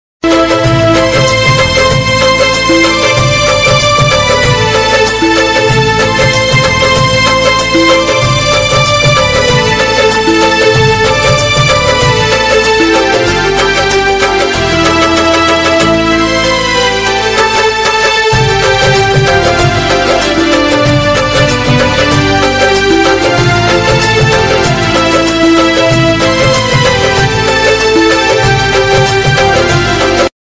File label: desh bhakti ringtone instrumental ringtone; 15 august